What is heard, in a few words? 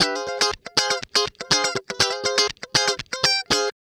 Guitar Licks